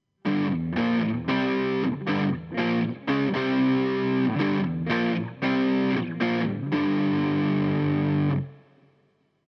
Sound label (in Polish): riff wstęp